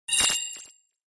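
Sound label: Hit Electric Trill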